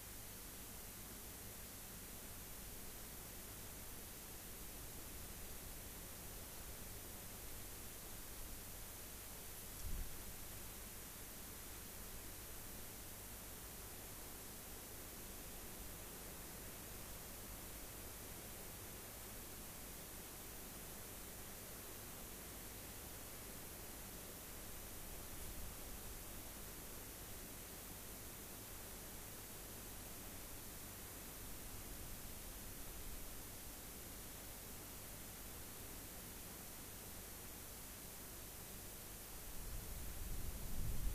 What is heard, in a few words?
rayol farm; SEAMED